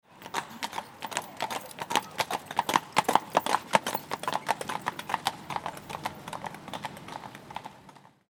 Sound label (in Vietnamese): Tieng ngua chay